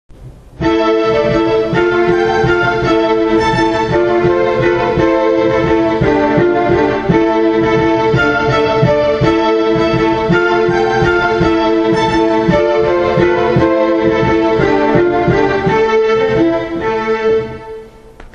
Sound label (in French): musique